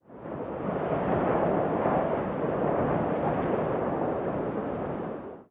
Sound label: ambient battle; World Of Warships